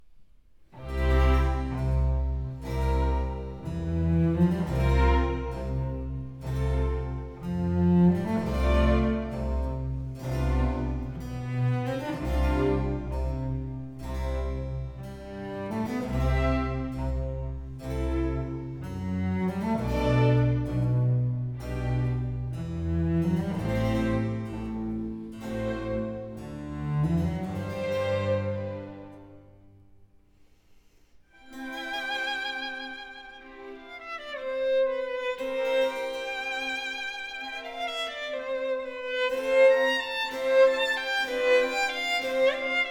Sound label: Violin Concerto No. 1 in A minor